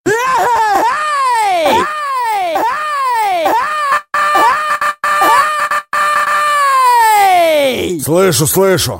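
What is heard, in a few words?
scream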